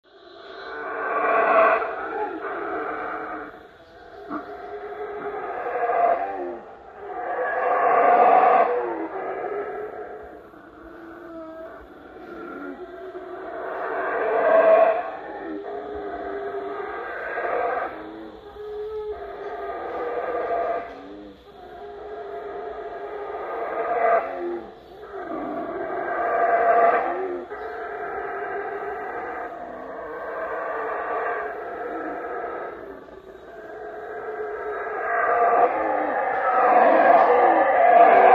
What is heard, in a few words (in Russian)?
gorill